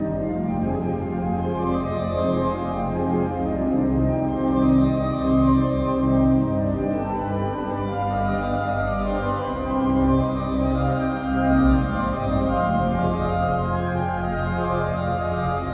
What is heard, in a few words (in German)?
Orgel; Bernburg Marienkirche Roever; denkmaltag